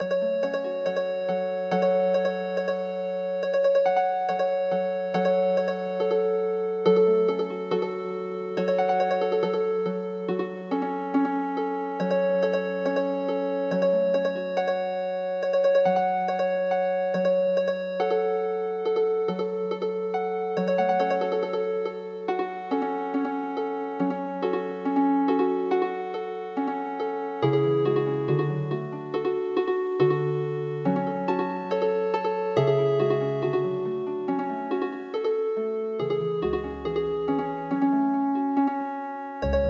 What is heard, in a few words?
Healing Harp